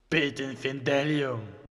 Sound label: agression, satan; customnpcs